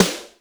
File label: snooker, snares real